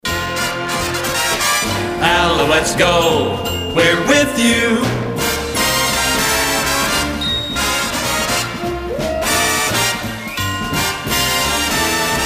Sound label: Sports Open; CFCF; PAMS; How about this sports fans (musical pad)